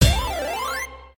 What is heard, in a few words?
stop bonus; Golden Joker